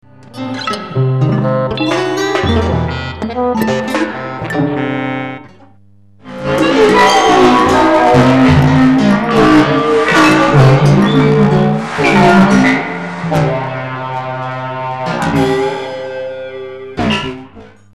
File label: Distortions, modularsynthesis